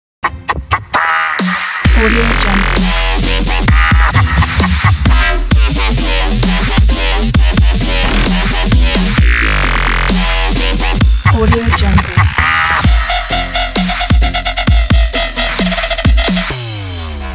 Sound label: halloween dubstep